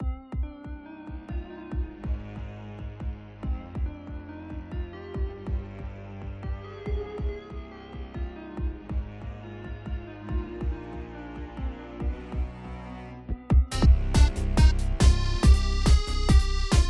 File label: caves tense; shattered pixel dungeon